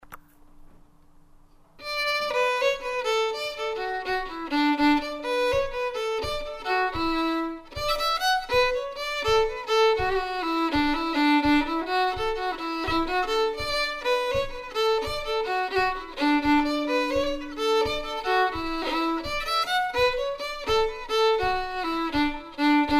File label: Strike the Gay Harp, fiddle